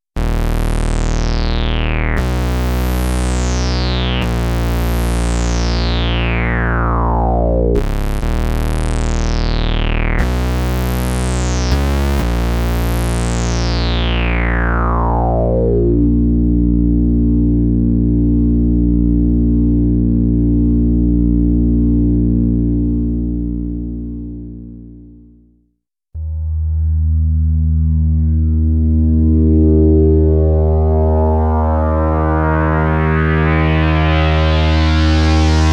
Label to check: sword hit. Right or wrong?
Wrong